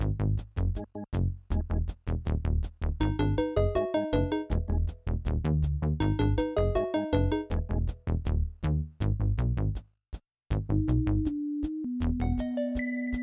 Generic Fight Low; Plants vs. Zombies Battle for Neighborville

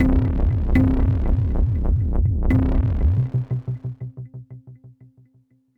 Day Amb Stinger, gamerip; dying light

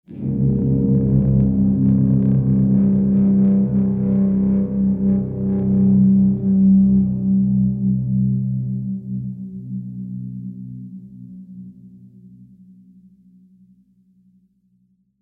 Scary Guitar

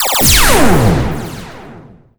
Fire Energywave

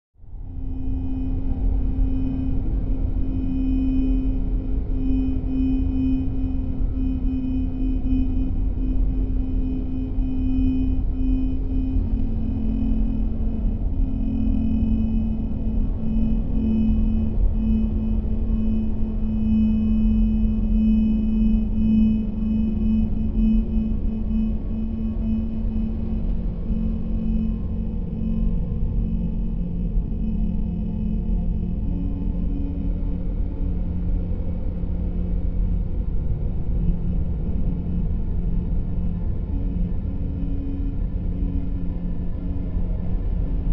Cemetery Background Atmosphere; Too Loud

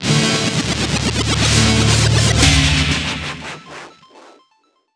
TELEPORTER

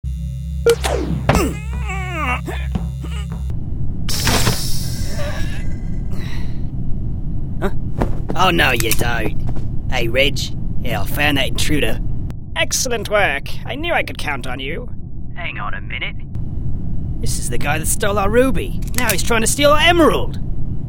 Open, Station Vault

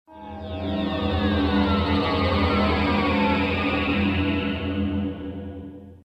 Fighter Transition

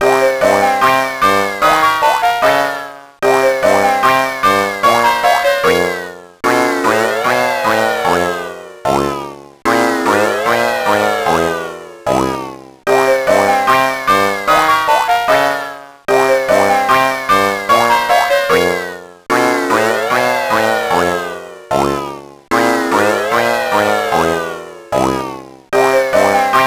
you lose; Camp Lazlo Leaky Lake Games; GBA

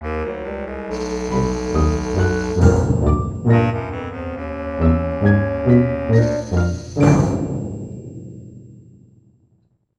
Motown Intro End

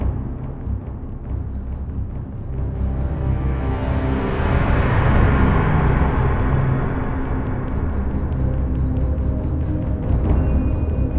Zeppelin Ambient Full Loop; The Golden Compass

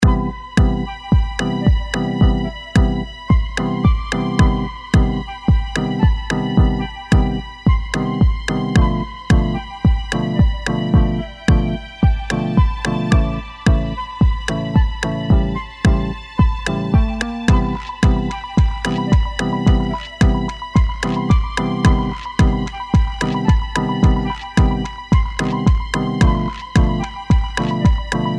store music; dokobots

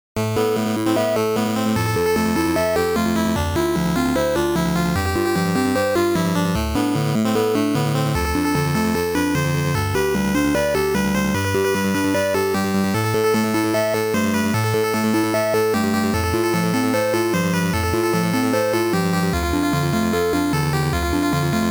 BGM ~ Warp

